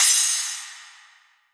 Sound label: Crash, Cymbals; Vengeance - Essential Clubsounds Vol.5